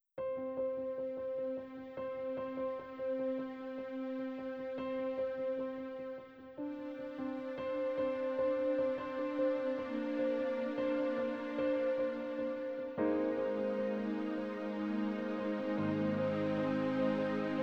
Strings Pad, Yamaha NP-15 pianino cyfrowe; Yamaha NP-15 WH

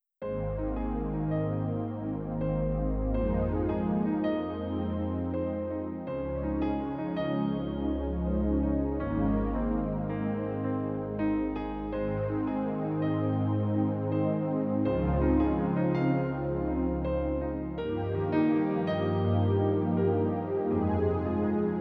Synth Pad, pianino cyfrowe; Yamaha NP-15 WH

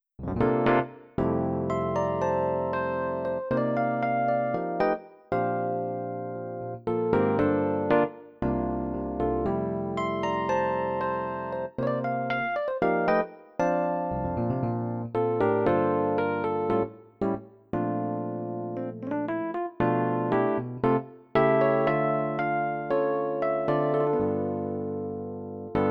Stage EPiano, pianino cyfrowe; Yamaha NP-15 WH

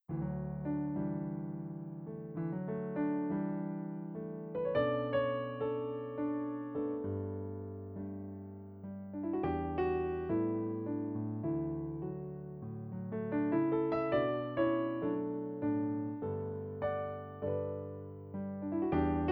Mellow Grand, pianino cyfrowe; Yamaha NP-15 WH

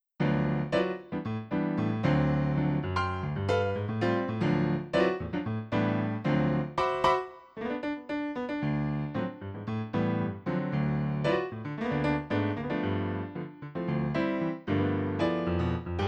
Bright Grand, Yamaha NP-15 pianino cyfrowe; Yamaha NP-15 WH

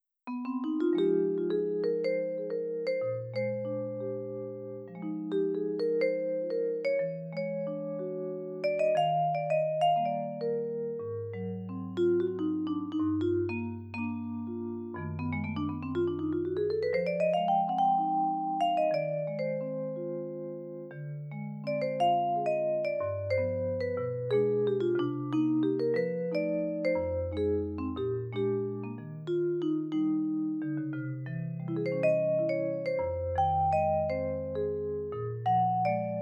Vibraphone, pianino cyfrowe; Yamaha NP-15 WH

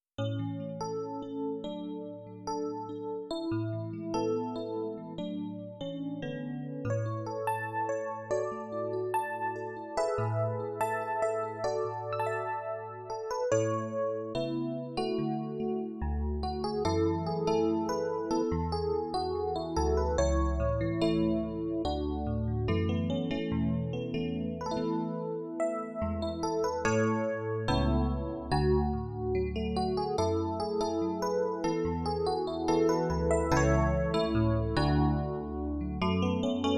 DX E Piano, Yamaha NP-15 pianino cyfrowe; Yamaha NP-15 WH

